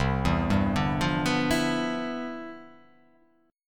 C Major 11th C down; CM11 arpeggiated; JGuitar

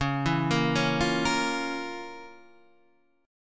F Major 7th Suspended 4th Sharp 5th C sharp

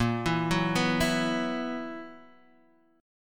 B Major 7th Suspended 4th Bb

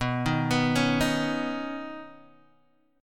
B Major Add 9th B, Guitar, Open G

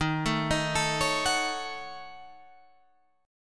E flat Minor 7th Flat 5th; arpeggiated; chord; 11,12,13,14,14,14; JGuitar

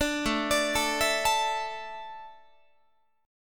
A Suspended 4th, Guitar; Asus4; arpeggiated; chordshape

